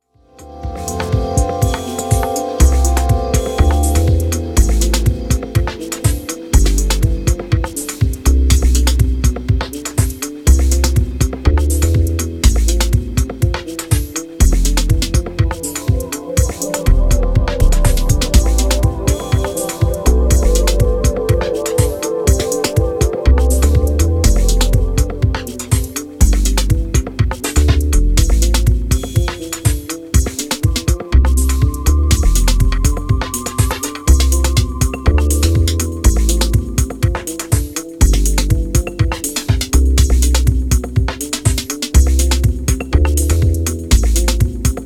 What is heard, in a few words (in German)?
Keyboard Musik